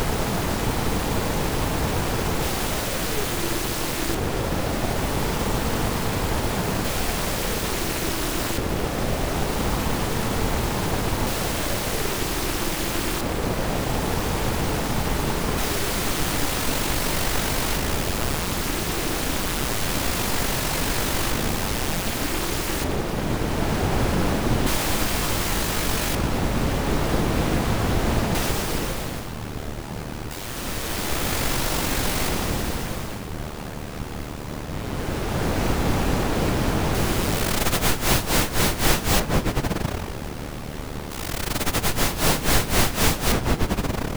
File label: noise